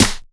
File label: Snare, Dubstep One-Shot Drums